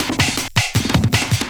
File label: LOOP L, Drum & Bass 160 BPM